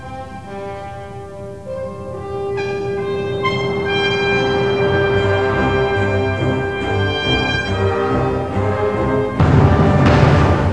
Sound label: fanfare